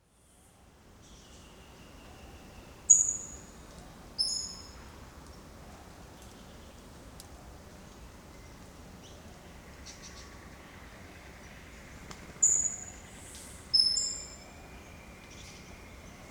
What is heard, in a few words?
Green Catbird Ailuroedus crassirostris